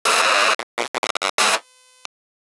Tech, Weird Side Samples

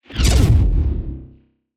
Just Whoosh; SoundBits

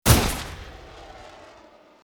Bullet Time; FXHome; Bullet and Gun

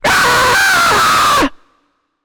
Mangled Scream; Jump Scares

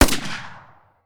Rifle M1 Garand 30-06 Close; World Wars; WatsonWu; Rifles & Pistols Of The World Wars

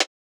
Percussive Elements

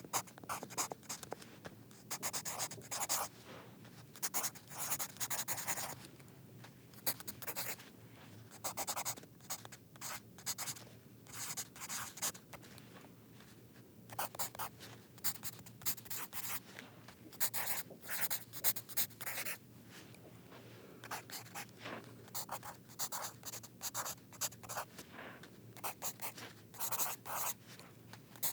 Handwriting; TheWorkRoom Audio Post

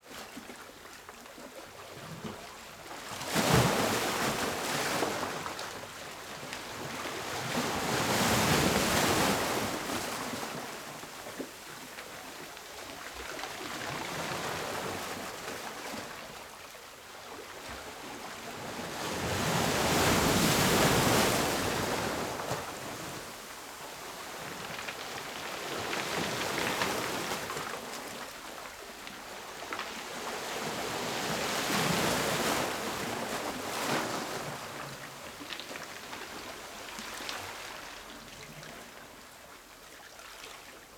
Small beach rolling stones, Calm sea; Ivo Vicic

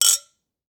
drinks glasses touching; Kitchenware; 3maze

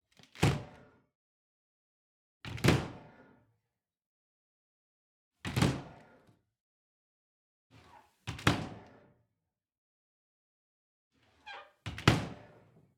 China Cabinet Door Wood with Glass Close x5; Soundopolis; Household Doors HD

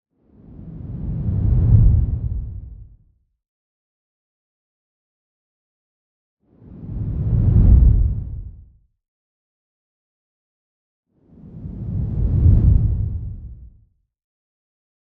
Whoosh Low; Justsoundeffects; Transition Whooshes Vol. 1

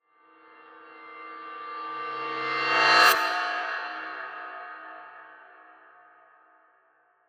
Whoosh; MatiasMacSD; SURREAL

